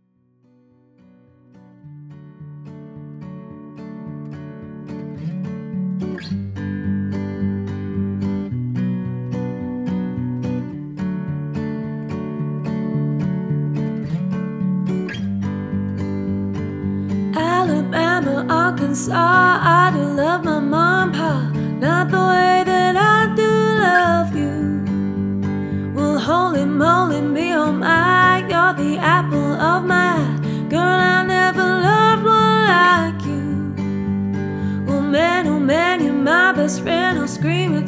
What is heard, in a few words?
GUITAR Track